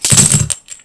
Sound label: coins minus